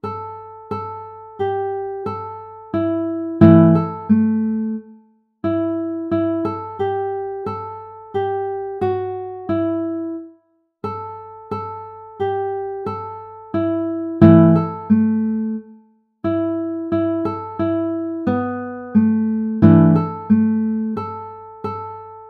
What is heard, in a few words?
banjo